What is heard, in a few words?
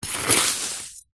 knife scrape dirt